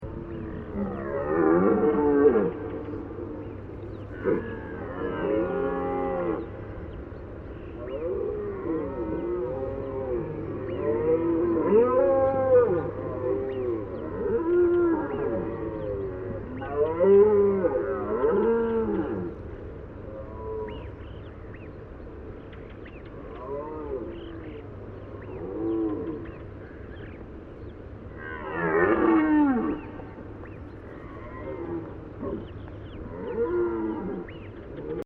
Brame

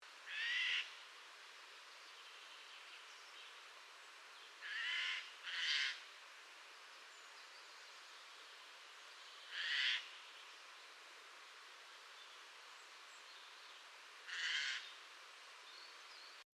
Geai des chênes

